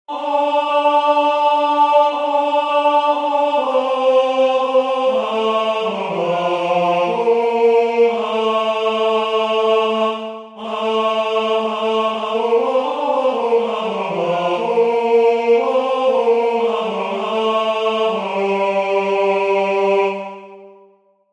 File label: Grace Tenor; citysings